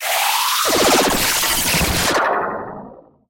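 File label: disappearing sound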